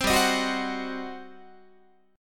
G Major 7th Suspended 4th Sharp 5th, G, down, Guitar; GM7sus4#5; strummed; 15,15,18,16,16,17